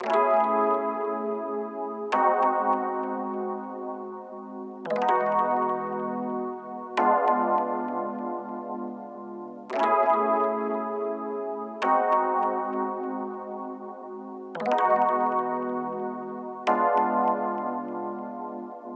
99 BPM C# Min Rhodes; Cymatics; Monogram

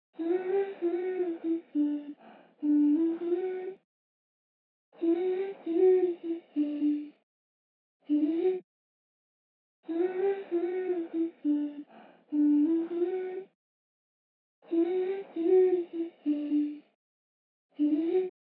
99 BPM B Min Vocals; Melody; Loop Stems; Cymatics; 2022 Melody Collection - Various; Breeze

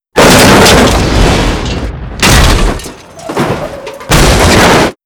Vehicle Impact Sweetener, Crashes; Vehicles

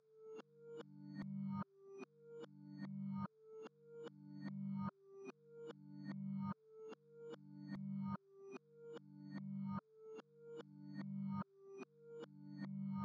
Reverse Elements, 147 BPM G Min; Cymatics; Angels

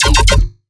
Dirty Bass E 150, Bass Hits; One-Shots; Ghosthack - Color Bass Essentials

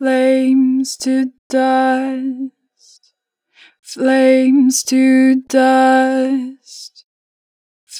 Flames - 4 bar vibrato, Vocals, Natural Vibrato; Harmor Vocals

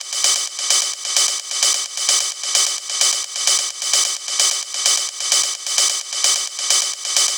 Ride Loop 130, Techno; Techno Sounds 2024; Rides

